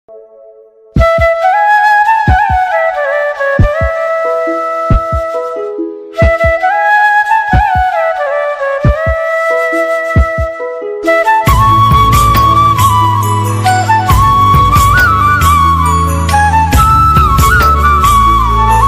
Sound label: song bgm ringtone south indian bgm ringtone